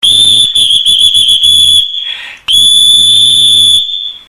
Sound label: thunderer, whistle